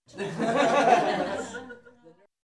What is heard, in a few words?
Minimal People Laughing